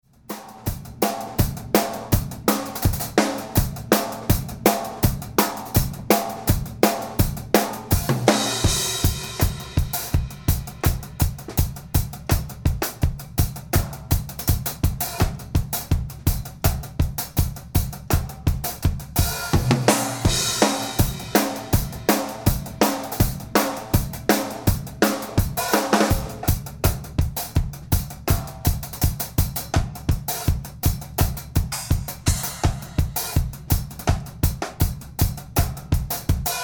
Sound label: Drums With Mono Drum Room